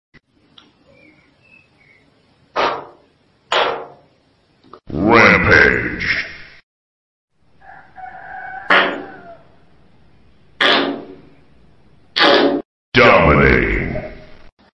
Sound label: nuclear fart